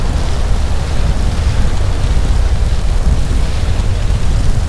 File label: FIRE; Shadow Master